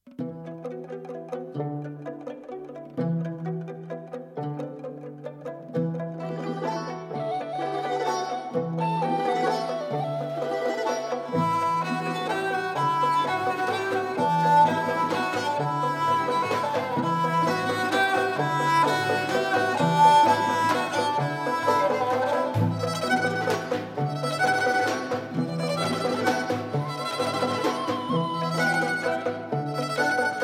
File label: Instrumental